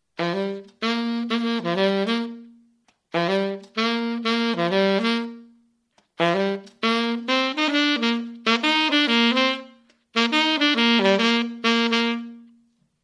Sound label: tom